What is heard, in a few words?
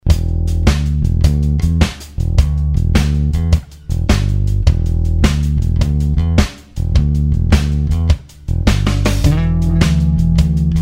Bass Groove solo